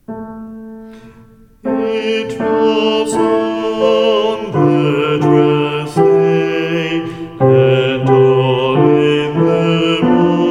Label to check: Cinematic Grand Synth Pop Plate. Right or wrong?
Wrong